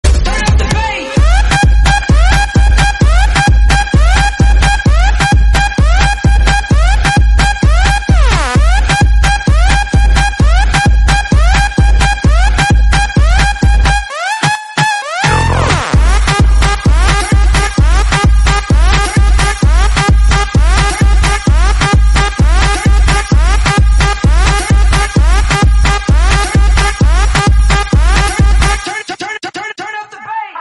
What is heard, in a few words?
ringtone